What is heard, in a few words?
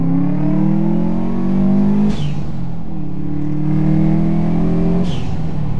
respons whistling trumpet atmosphere in car